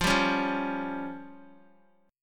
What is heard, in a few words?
F Suspended 2nd Sharp 5th F down Guitar; Fsus2#5 strummed; JGuitar